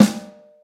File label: puffy snare sound g sharp key; snare drum one shots